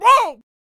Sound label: Potionseller Attack Vocals